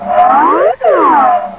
Stretchy noise; How Many Bugs in a Box

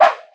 jump; TrickStyle

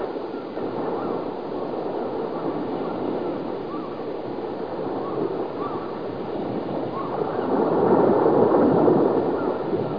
waves

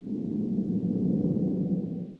noise

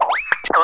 Worm Die; worms2

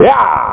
Hyahh!; Ballcatcher

